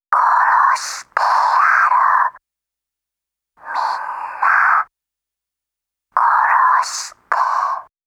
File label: Radio; Clock Tower 20th Anniversary Sound Collection